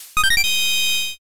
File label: all clear